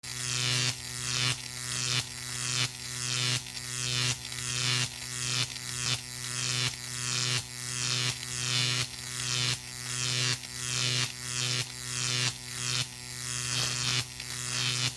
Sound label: Sci fi energy generator loopable constant humming